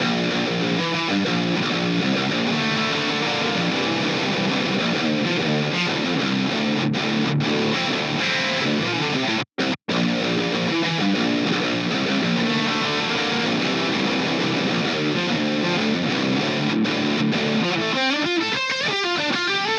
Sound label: Guitar Blend